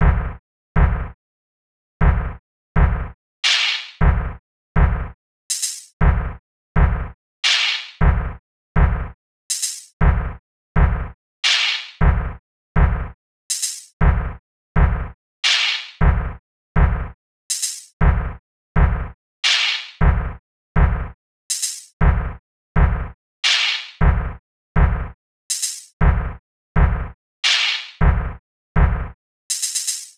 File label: Sinewave